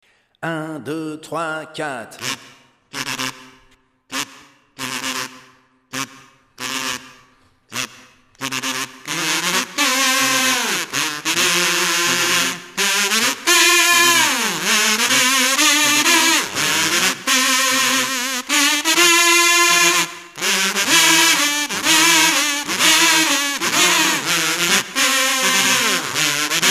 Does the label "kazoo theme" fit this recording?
Yes